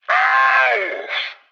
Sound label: hit yell; Blind Drive